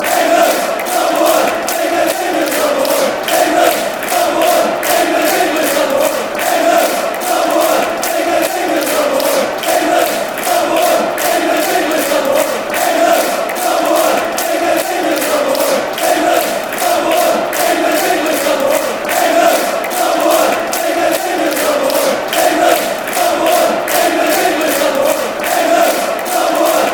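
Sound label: fanchants, football chants; englands number one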